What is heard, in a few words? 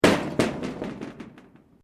METAL SHEET MOVEMENT; Materials